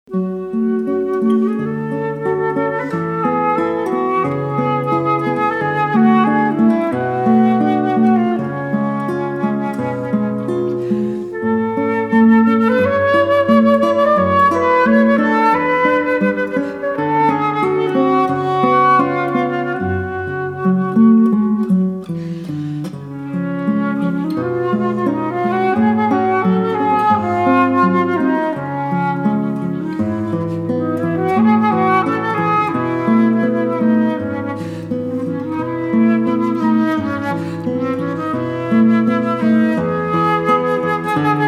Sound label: Flute Guitar Pavane